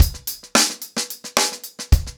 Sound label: Hip Hop 110BPM, acoustic drums drumloops